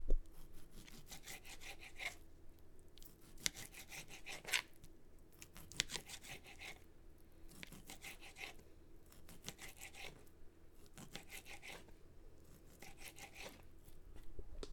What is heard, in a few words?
Cutting Meat With Dull Knife